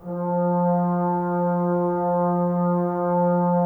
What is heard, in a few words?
Woodwind Brass Ensemble; Miroslav Vitous